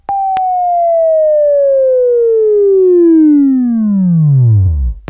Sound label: WAV Right, Sweeping; Sweeping Training program